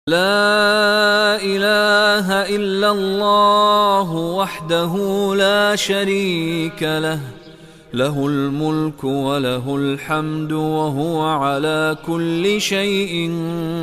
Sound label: Islamic ringtone